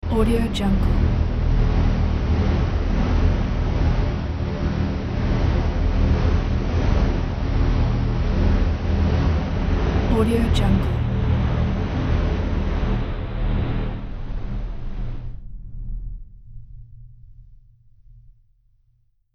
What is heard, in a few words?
throbbing energy pulse